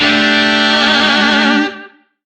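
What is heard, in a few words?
Shawzin Pentatonic Major Chord; Warframe; Lizzie Shawzin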